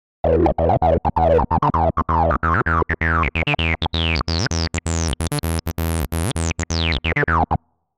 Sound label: filter acid